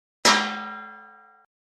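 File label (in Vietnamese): tiếng đánh nhau bằng chảo rán thức ăn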